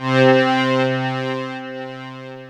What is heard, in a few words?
String Machine, FM; Polyend